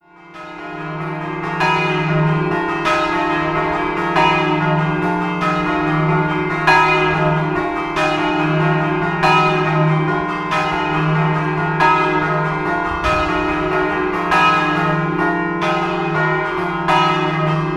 Scampanottata, campane

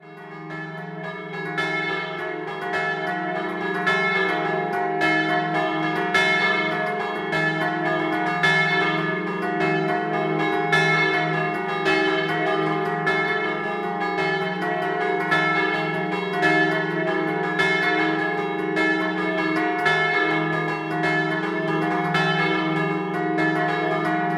Udine San Gottardo Scampanottata

